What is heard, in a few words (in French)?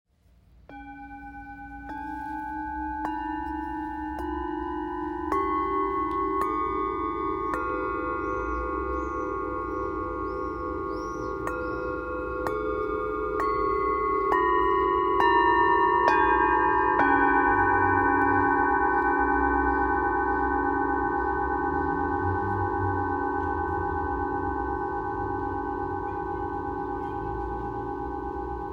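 Set realFullMoon, bol chantant; Crespuscule; jam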